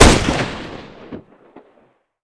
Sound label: scout fire, weapons